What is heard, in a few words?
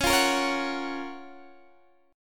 Gb Major 7th Db, guitar